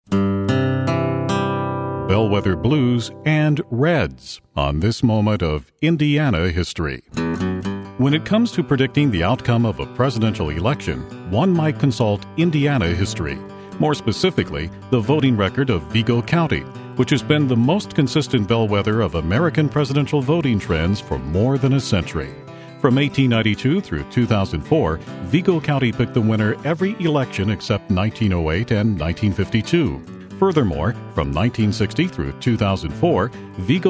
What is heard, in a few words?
blues